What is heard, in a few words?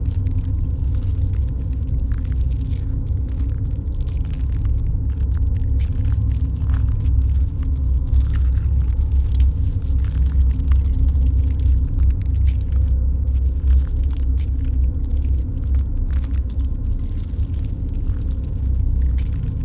pro ship mining station idle; biogenesis